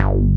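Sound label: JX MOOG BASS; Pro-Rec - Synthsonic; TRINITY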